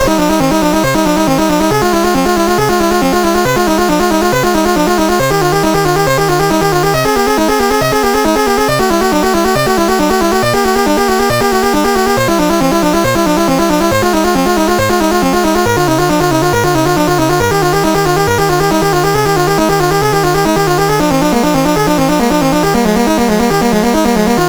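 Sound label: River Chase, MOS 8580 SID chip music, games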